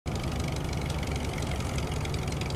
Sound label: Drive; Varg